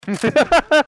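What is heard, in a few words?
ringtone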